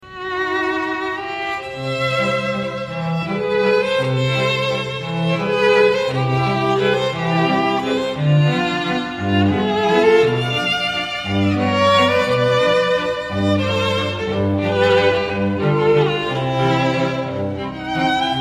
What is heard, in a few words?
waltz